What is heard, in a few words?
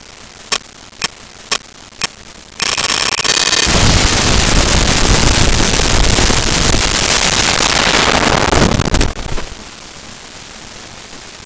Time Bomb